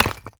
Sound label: land brick